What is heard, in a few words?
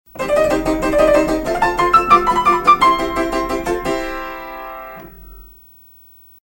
Rinky Tink Piano Chase; PAMS